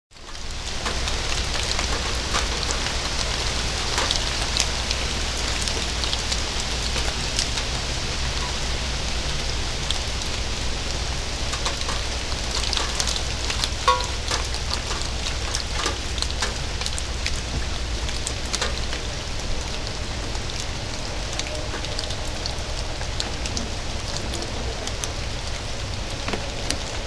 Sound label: binaural rain